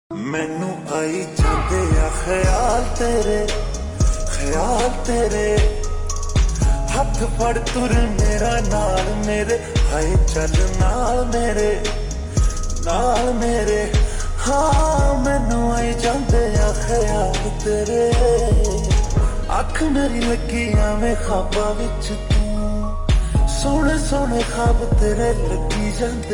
Slowed Reverb Ringtone

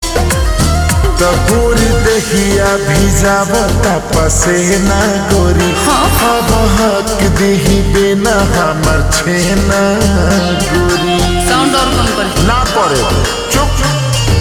Slowed Reverb Ringtone

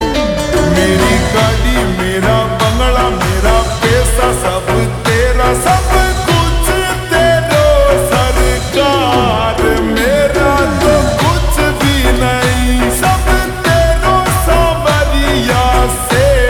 Ringtone Slowed Reverb

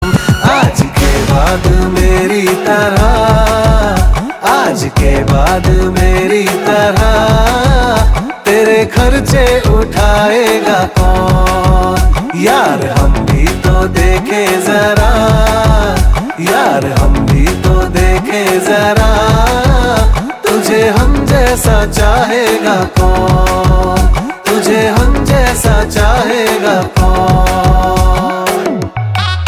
Ringtone

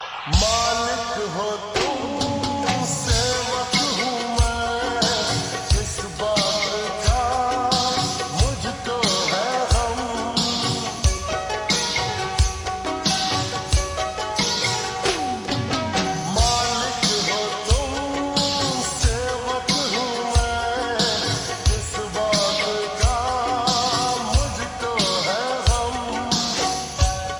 Slowed Reverb Ringtone

